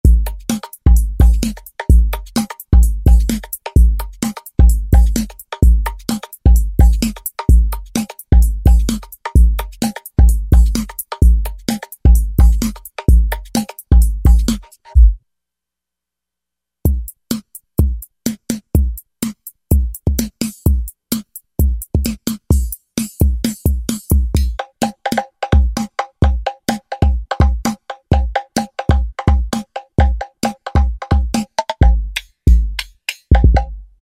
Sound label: nuTR KIT, Roland V-Synth